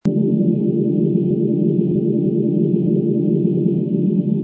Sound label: Normal Reel Run; hockey